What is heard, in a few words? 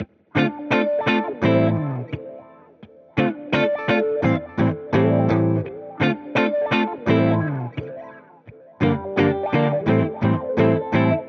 85BPM Key dm, real guitar loops; MakinMoves; vibe session